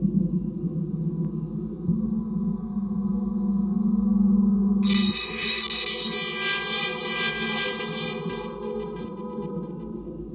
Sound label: abstract something zinging loop; LOOPING AMBIENCE